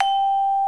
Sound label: MARIMBA VIBES